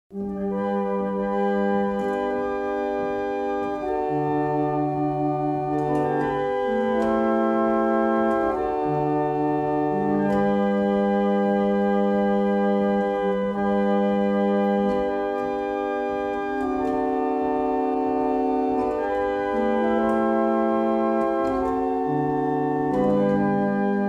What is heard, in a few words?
German Hymn, barrel organs; Wardley